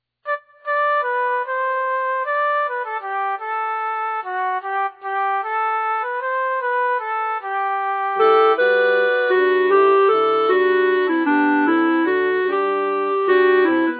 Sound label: alto; pfautsch